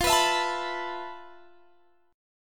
F Major 7th Flat 5th, F, down, Guitar, Standard; strummed; JGuitar

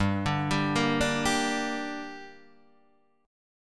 G Minor G down Guitar Half Step Down, chord

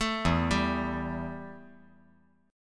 E Minor Double Flat 5th E

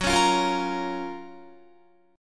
Gb Major 7th Gb down Guitar; GbM7 strummed; JGuitar

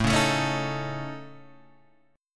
A Major Flat 5th A, chord; AMb5 Chord {5 4 7 6 4 5} strummed

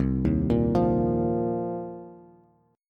C Minor 11th C Bass Bass Standard; arpeggiated; JGuitar

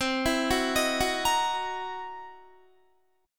C 7th Sharp 9th Flat 5th C Guitar; arpeggiated; JGuitar

